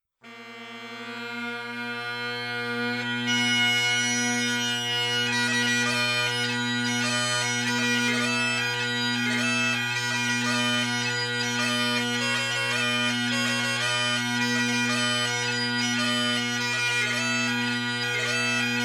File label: banjo breakdown